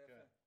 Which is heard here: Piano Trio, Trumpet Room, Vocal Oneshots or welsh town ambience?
Trumpet Room